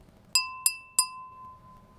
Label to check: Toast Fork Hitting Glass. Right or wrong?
Right